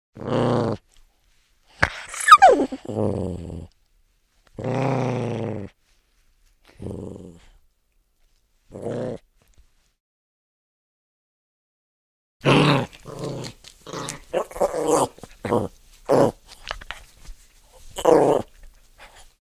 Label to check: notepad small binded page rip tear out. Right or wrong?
Wrong